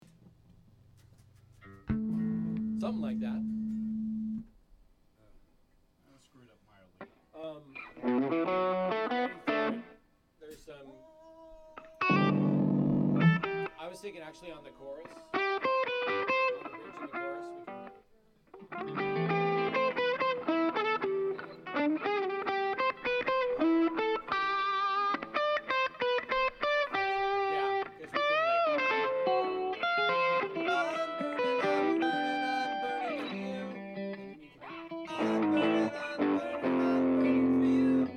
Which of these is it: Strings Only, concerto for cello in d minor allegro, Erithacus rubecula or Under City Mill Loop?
Strings Only